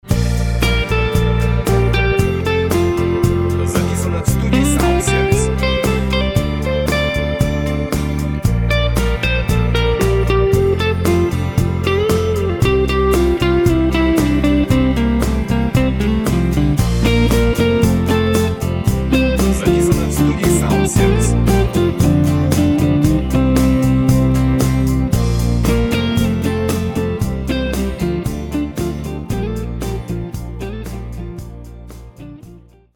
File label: Guitar; фоновая музыка - партия живого инструмента